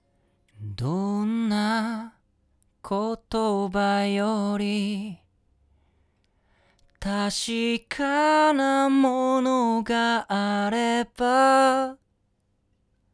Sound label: TELEFUNKEN U47 Tube